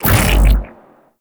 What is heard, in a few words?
Shield Pass Through B; Enemies; Weapons; Warframe; Arachnoid Camper